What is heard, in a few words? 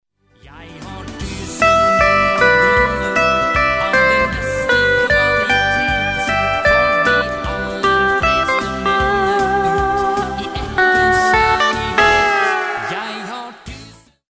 steel